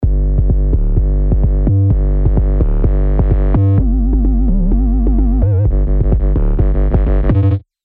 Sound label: 808 Duck Bang; revealed serum 2 dirty dutch vol 1